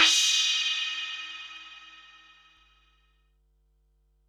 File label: HH, Pearl SC-40 Hihats and cymbals